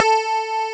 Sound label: digi decay